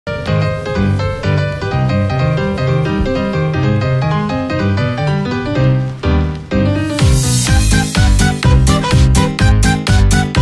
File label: spooky doot doot skeltal